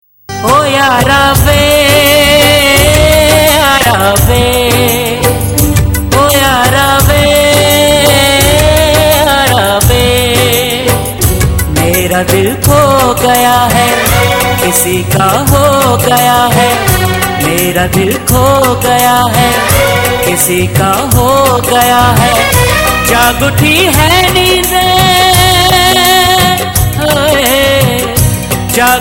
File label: sad hindi ringtone, bollywood